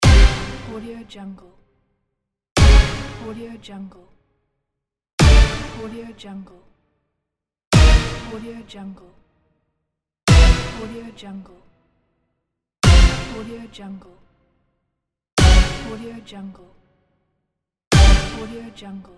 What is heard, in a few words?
slot progressive; gamechestaudio